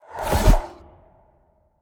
OnRelease; Spinal Serve

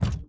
Player Grab

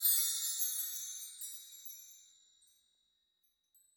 CHIME Orchestral Fast; SORCERY

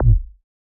Ball Collision Forcefield Extra Light

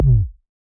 Ball Collision Forcefield Light

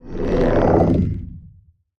Center Ring Ball Asencding

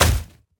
Racket Snowball Hit

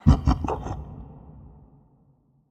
Laugh; Spinal Serve; Wieldables

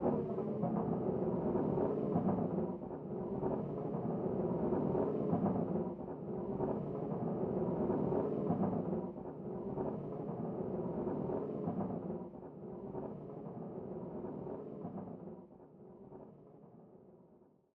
Player Hand Slide Roll Slow

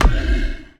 BallHit Hard Breathy; Spinal Serve

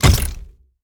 Pulse Wrench Hit Ground Other

